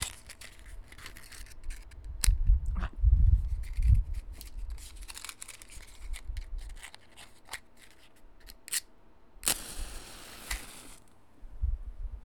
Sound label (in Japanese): マッチ点火